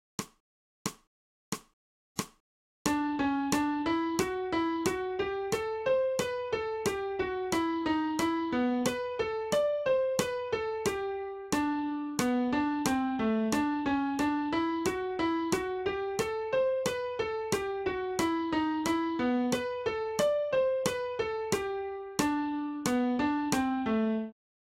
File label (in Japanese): 新フレットレス